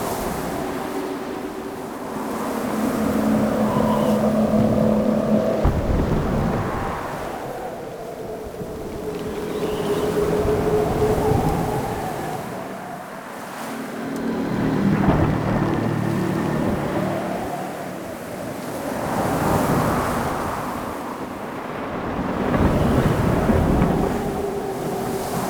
Exterior Background Amb Loop, ambience; Duviri Murmur

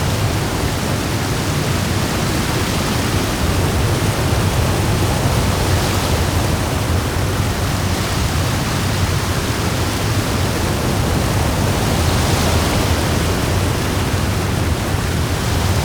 Water Fall Large Loop; Warframe; Duviri; Ambience

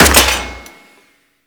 Sniper Rifle Mech; Warframe; Weapons; Tenno

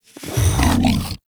Vocal Breathe Fast

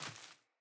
Sandals Run Vegetation, Footsteps

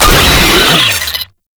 Player Spotted, Vocals; Enemies; Warframe; Proto Infested; Brachiolyst

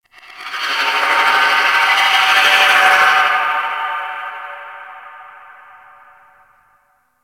Misc One Off, Ambience; Warframe; Tenno

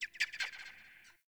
Venus Landscape Mid Creatures; Warframe; Venus Open World; Ambience

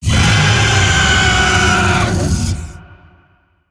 Vocal Attack; Warframe; Zariman; Void Angel

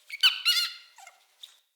Messenger Rat Chatter B; Ambient; Vocals; Verminia; Animals

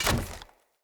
Short Sword Medium Impact Wood Hollow Large; Weapon Impacts; Player; Swords; Environment

